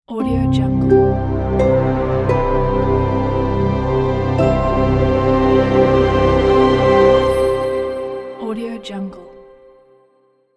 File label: logo intro